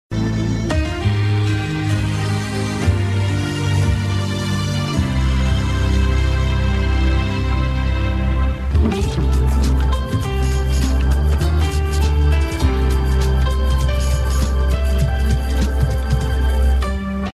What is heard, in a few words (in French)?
jingles; RFM